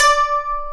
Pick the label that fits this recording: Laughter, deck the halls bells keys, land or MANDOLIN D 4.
MANDOLIN D 4